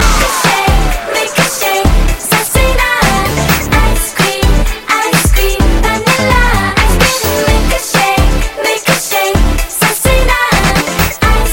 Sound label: Ice Cream